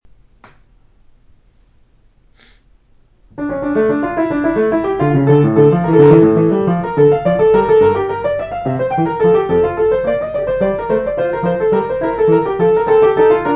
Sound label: bach prelude d minor